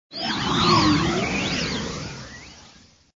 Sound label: Wind Transition Out; moonstone madness